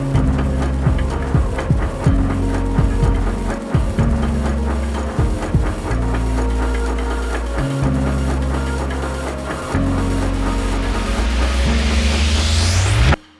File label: rhythm and cloth